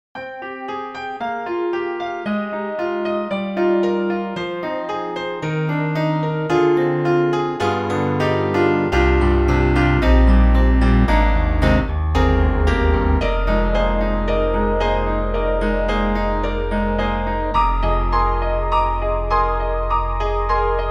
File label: Soulful Stack; Steinberg; HALion 4